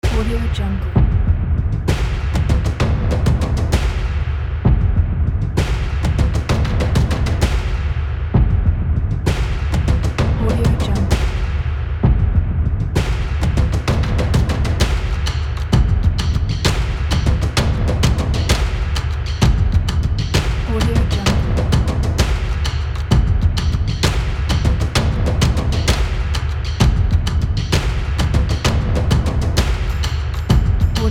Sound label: dark percussion